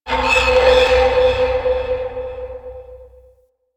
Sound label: Metal Groans And Scrapes, Asteroid; Warframe; Grineer Asteroid